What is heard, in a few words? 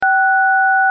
keypad press